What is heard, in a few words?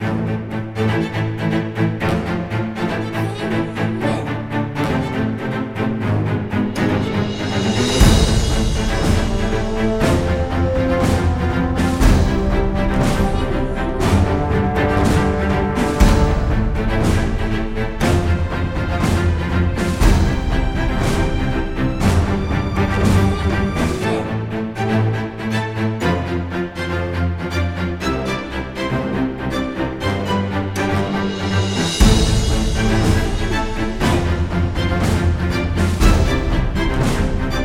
Loading